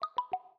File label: Tick tack, notification; Tecno Phantom Pad II (G9)